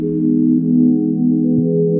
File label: Strum; Inspired by Nature by Dillon Bastan; Vector Grain Presets